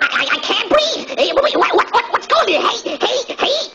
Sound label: DROWN; vortex; porkypig